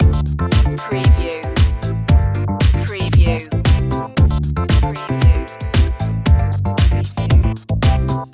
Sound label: house chilling loop